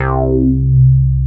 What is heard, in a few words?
BASS